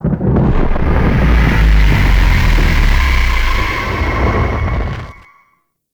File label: solar flare start; Warframe; The New War